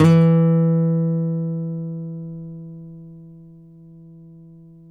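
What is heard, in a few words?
nylon, FLAMENCO, Guitars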